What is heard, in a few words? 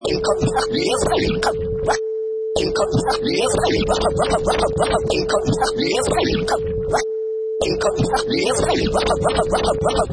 Vinyl Scratch In Comes the Bass 95 bpm